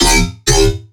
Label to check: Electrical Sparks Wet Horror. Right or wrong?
Wrong